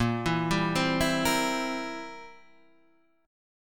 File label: C 7th Bb